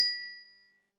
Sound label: bell